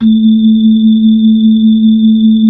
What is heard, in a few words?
B-3, Hollow